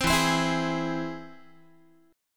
E Augmented E down Guitar 4A 4E 5A 5D 5G sharp 6C sharp; strummed